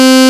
TRI SAW HARD FM, SYNC+FM PADS; Zero-G Ambient 1

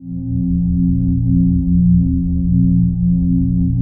PAD, DIGI-PAD

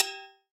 Cow Bell, 120bpm; Big Fish Audio; Old Skool House; Construction Kits; House of Amnesia

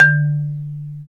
Bass Marimba

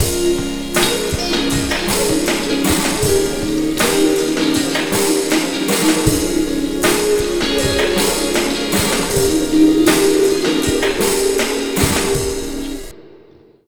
Slo Mo Full-A#, Slo Mo Funk Mixes, Lounge; Zero-G Kocktail Kollection; Construction Kitz (Lounge)

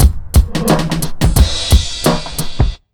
88 BEAT, Pure Electro, BEAT MIX

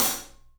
Studio Hats, 3DPercussives

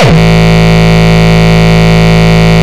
Longkick, kicks

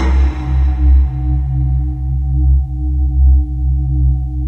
bowww C2

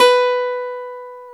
GTR DOBRO, Bnd E; Club-50 - Foundations Roland; Dobros

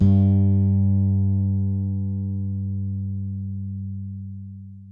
ALEMBIC BASS FING G2; Masterbits CLIMAX 7 - Bass

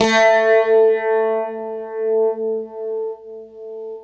UNIVIB A, Guitar; East West; Steve Stevens Guitar Samples Collection

